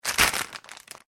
Plastic Packaging Movement; Plastic Packet; Materials; McKinney Sound

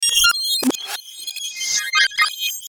TAPE REWIND, Tape Rewind Musical High Pitch; Tape Player; Production Element Imaging Element Accent Transition; Tape Player Sound Effects

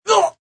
Grunt Impact Oh, Male grunt, painful impact; Groaning

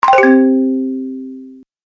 Deep Bonus Win, Video Game Sound Effects

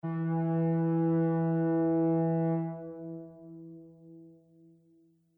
Ship Horn Distant Long; Boat Sound Effects; vehicle

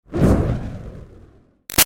Fire Torch Whoosh Med Burst; Flame Sound Effects; Objects; Big Room Sound

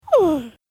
Cartoon Gremlin Sigh; Cartoon Voice Sound Effects

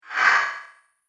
Single Swipe, whoosh

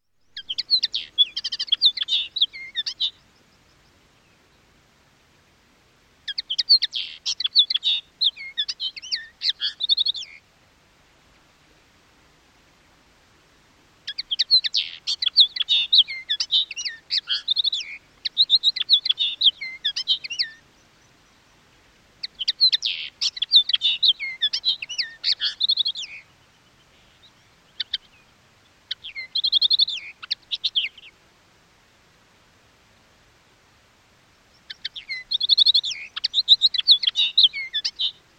Linnet singing; Part of Birds (Sound Effects:Animals); BBC

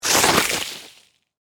Snow Ice Scrape, Weather, Snow, Ice, Scrape

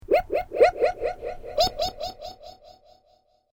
Created Creature Small Ping, Alien Sound Effects

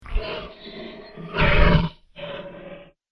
Dinosaur Wounded; Monster

